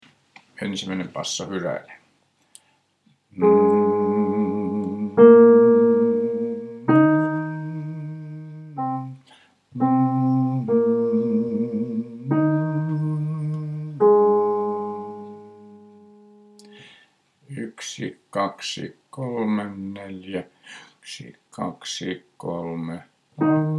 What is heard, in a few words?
basso; Pie Jesu; mieskuoron